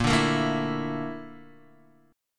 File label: A sharp Major Sharp 11th, Guitar, DADGAD